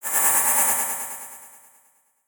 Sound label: Transition Delay Medium